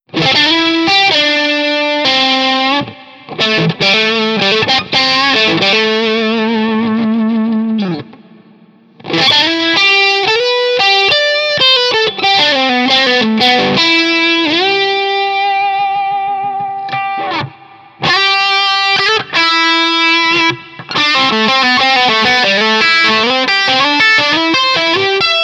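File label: Modern Dist Suhr Tele Lead, guitar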